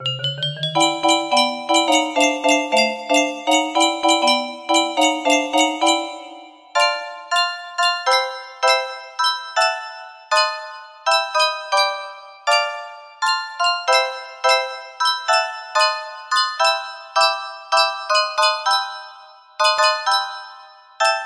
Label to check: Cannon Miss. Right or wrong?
Wrong